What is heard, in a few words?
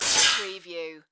Button Metallic Hit Reverb